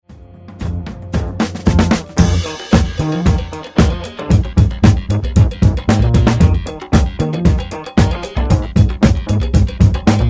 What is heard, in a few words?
Instrumental